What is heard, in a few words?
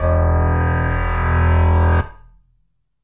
Moo Piano E1, backwards piano